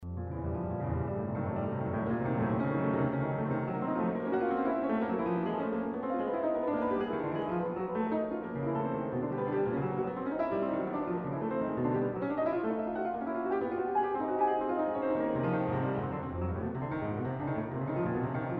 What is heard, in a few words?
Piano Sonata in B flat minor, Finale Presto; Chopin